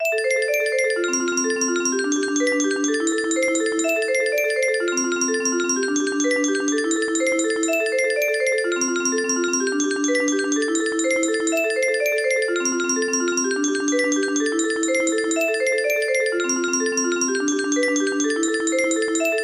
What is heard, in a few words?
bass